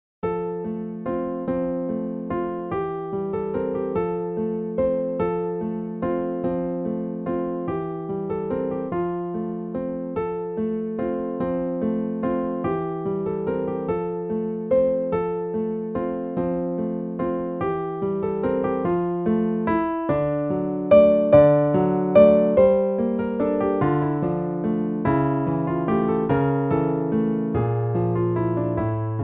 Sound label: Taiga-Melodie, piano; piano favourites